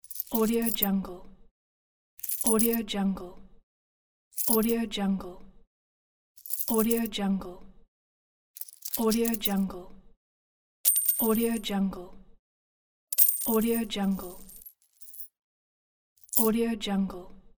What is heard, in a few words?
coins sound effects; billionsounds